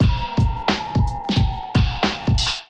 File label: Groove 89 Bpm, Drums; MIDI Clips; Numerology; Ableton; Chop and Swing